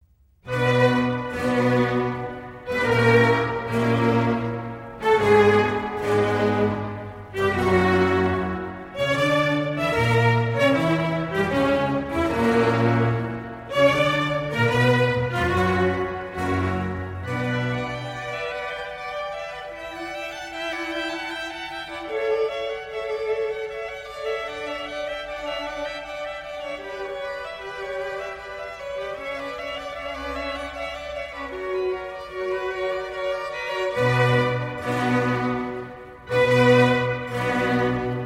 Concerto in D major for 4 Violins; 12 Concertos, Op.3; L'estro armonico; Concerto no. 1; Vivaldi; Academy of St. Martin in the Fields

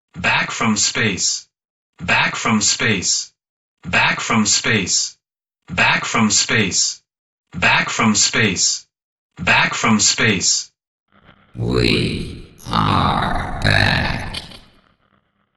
Accapella

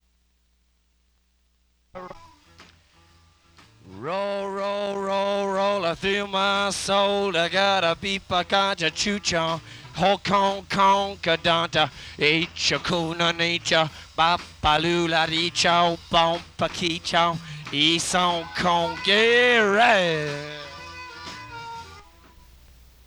vocal vamp fragment; 1969-02-25 - Sunset Sound Recorders, Los Angeles

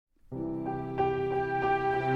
Orchestral

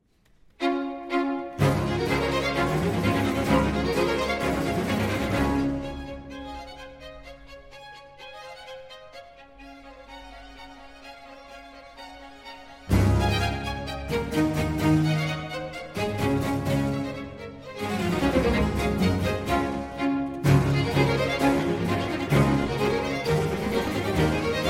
Concerto for Strings in D Major, Allegro; Vivaldi; Concerti per archi III e concerti per viola d'amore

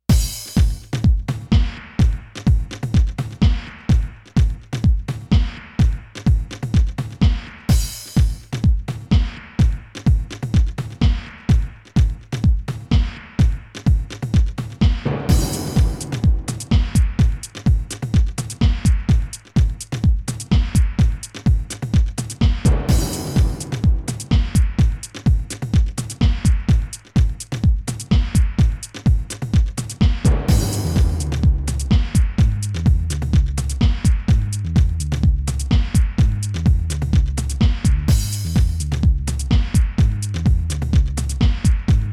Instrumental

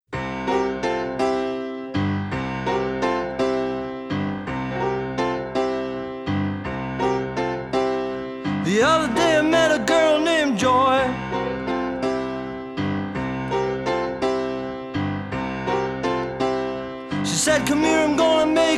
Joy Piano Version

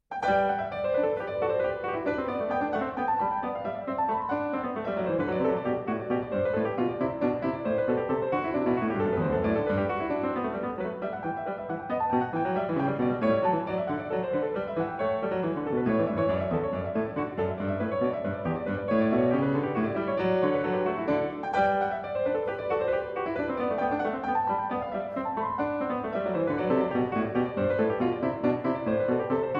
French Suite in G, Courante; French Suite No.5 in G, BWV 816; Vladimir Ashkenazy; Bach French Suites, BWV 812-817

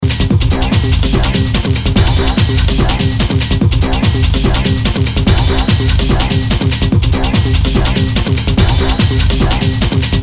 Perc

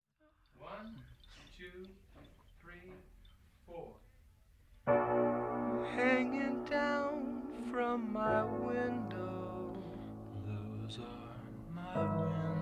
Wind Chimes Vocal Overdub Attempt

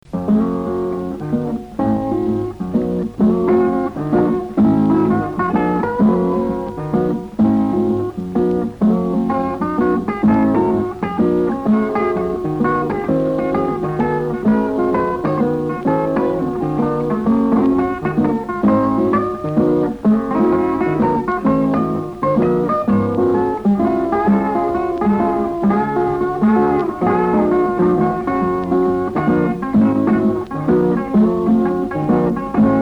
Instrumental

